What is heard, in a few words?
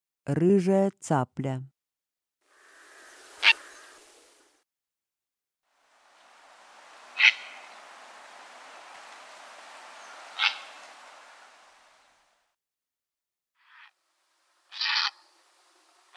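ryzhaya caplya golos, purple heron voice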